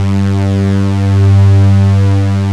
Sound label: Synth Str G1; Mo' Phatt; Digital Sound Factory Mo' Phatt